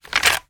medieval katana sword draw from sheath fast; still north media

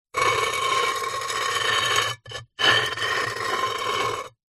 household pot ceramic base lid plate scrape

